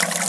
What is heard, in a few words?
water spray; ambient